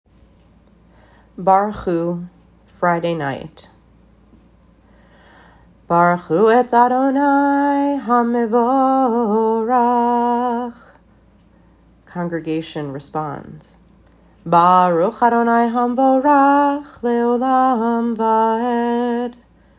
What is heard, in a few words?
friday night barchu